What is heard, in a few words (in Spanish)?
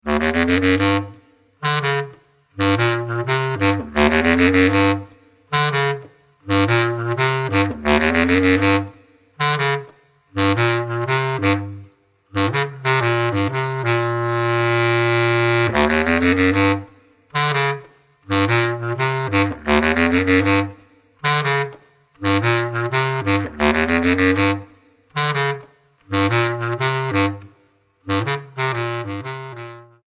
Clarinete Bajo; honduras musical 7mo grado